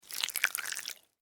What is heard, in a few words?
Worm, Crawl, Movement, Slimy, Wet, Insect, Bug; Worm Sound Effects; Worms (Sound Effects:Animals:Insects); Blastwave